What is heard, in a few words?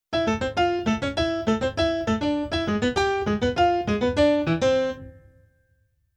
Keyboard Pf Latin Pf